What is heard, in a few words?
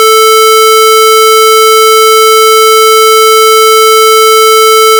sine 440Hz multiply square 880Hz